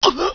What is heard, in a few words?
colette pain; svencoop; decay